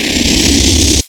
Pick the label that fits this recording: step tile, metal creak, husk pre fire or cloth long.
husk pre fire